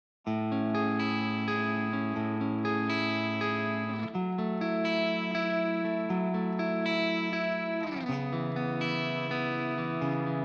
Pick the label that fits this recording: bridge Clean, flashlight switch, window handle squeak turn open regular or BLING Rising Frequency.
bridge Clean